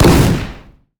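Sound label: fireworks missile barrage; Fireworks Corki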